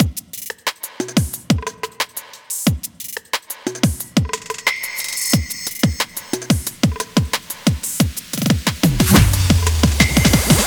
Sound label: intro drums